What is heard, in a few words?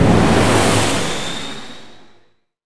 warp enter; Earth and Beyond; EA Games